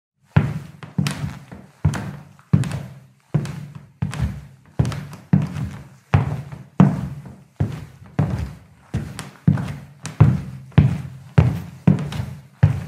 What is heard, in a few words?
Walking on parquet